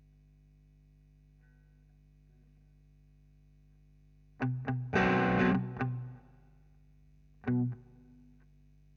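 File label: GTR Royer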